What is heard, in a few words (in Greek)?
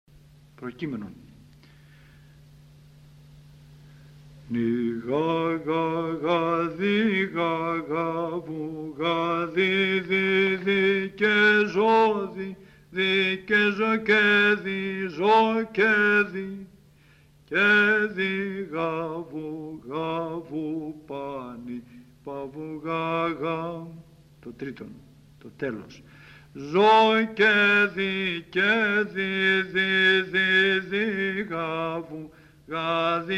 ΠΡΟΚΕΙΜΕΝΟΝ, Psaltichie; ΒΑΡΥΣ; ΑΝΑΣΤΑΣΙΜΑΤΑΡΙΟ; ΠΛ Α-Β-Δ ΒΑΡΥΣ